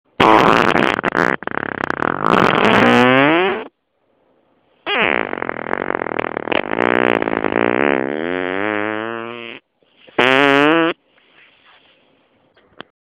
Pedo aireado

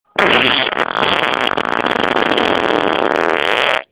Pedo lavadero

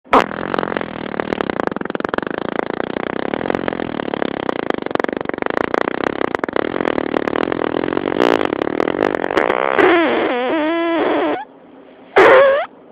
Ralenti y fuga

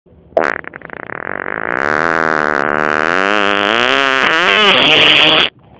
Pedo

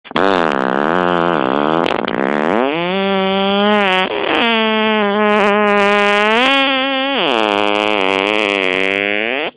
Pedo de impresion

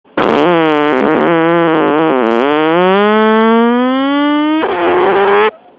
Ascendente con afonía final, pedo

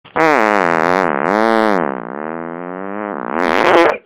Pedo camallera

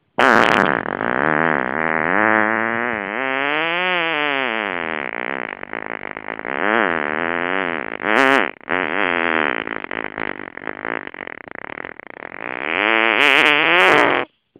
pedo

